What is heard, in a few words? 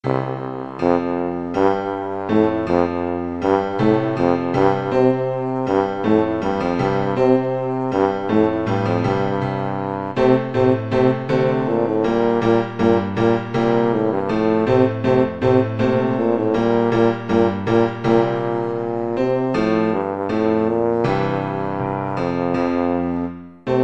handel water music, tuba